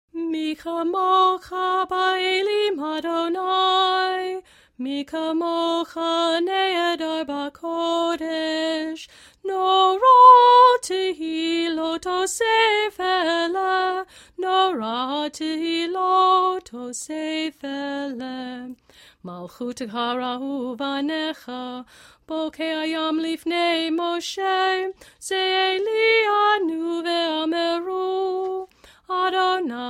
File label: Micamocha Chanted; Cantor; bnai mitzvah study guide friday evening